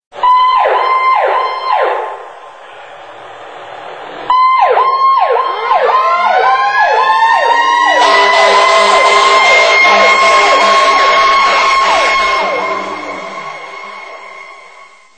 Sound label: fire